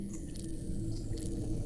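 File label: Music Dpad Evaporate 145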